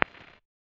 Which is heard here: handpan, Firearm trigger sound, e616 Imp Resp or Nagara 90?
e616 Imp Resp